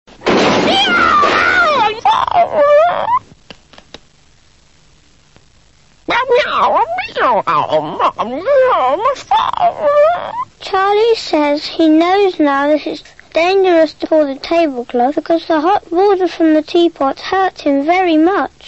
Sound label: Rap